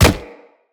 Step Default, Footsteps; Infested; Charger

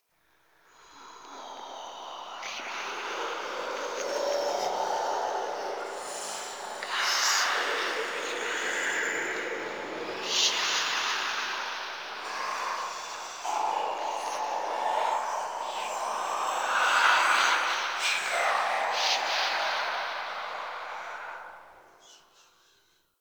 Whispers; Muruk Vull; Warframe; Ambience; Entrati

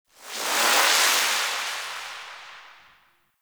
Power Rift Buff; Gameplay; Warframe; Zariman

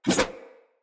Large Dagger Whoosh; Snc Large Dagger

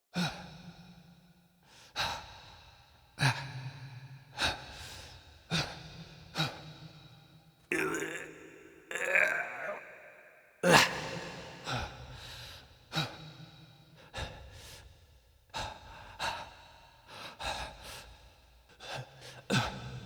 Wake Up Vocalizations Male; Envoy; Cinematics; Bromius; Bromius Quest Wake Up Poppet

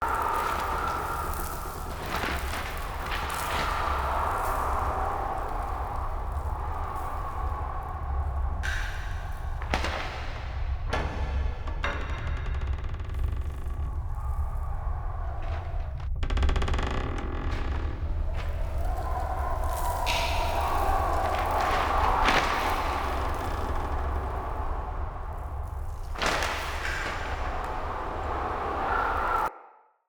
Amb Loop, Ambience; Soul Plane; Orlick

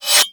Tonfa Blade Spin; Warframe; Tenno

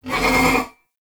Ram Idle Grunt; Warframe; Duviri

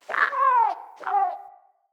Freed Beaver Call; Encounters; Animal Rescue

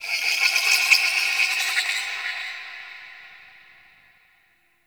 Sentient Texture Small, Ambience; Sentient Lair

